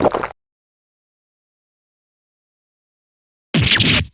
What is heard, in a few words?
chug spit